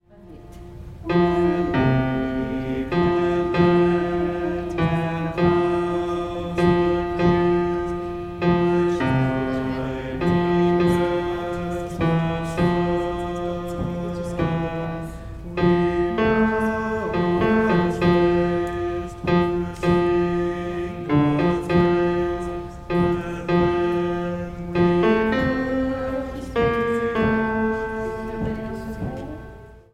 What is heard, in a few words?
Amazing Grace - tenor